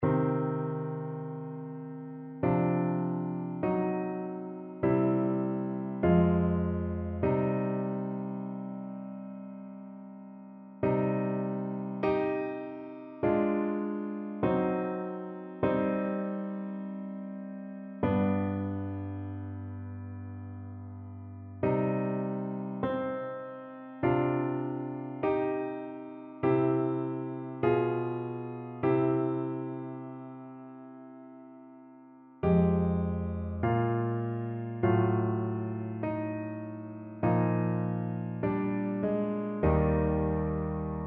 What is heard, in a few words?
aequale piano; bruckner